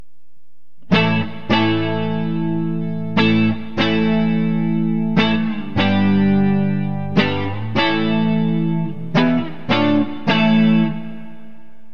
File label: neck clean; ant; Burny